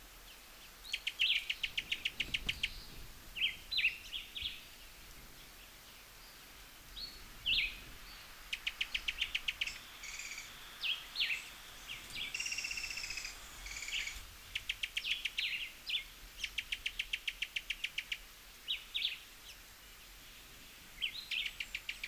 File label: Cuban-tody, پرنده تودی کوبایی; صدای پرنده تودی کوبایی